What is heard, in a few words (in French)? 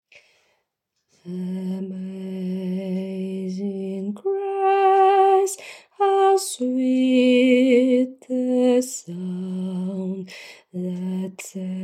Amazing Grace basse